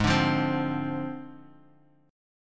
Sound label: Ab 6th Flat 5th Ab, Bass Standard; strummed; JGuitar